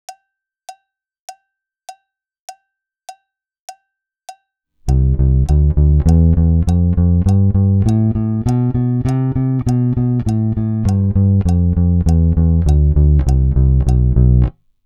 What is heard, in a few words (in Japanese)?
指弾き練習フレーズ